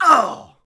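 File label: Axeman Hit Vox; Civ4 warlords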